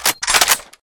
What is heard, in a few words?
rifle clipin; weapons; deluge